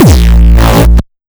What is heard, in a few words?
Industrial Crunch